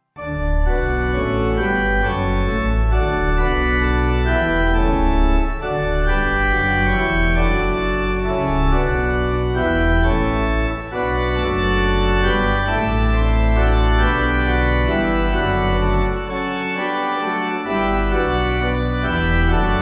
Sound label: The Sinless One, Pipe; Solemnis Haec